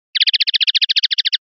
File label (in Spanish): rep torreos, pardillo